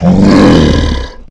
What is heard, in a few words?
alert; agrunt